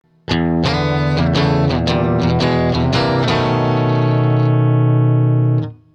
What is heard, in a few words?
blues phrase; fretjam